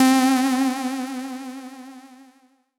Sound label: Synths Note C